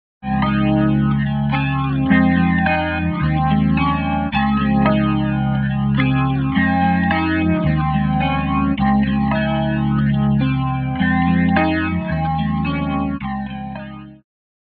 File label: Panning Chorus Guitar Wet; Vox Quantizer; syntheway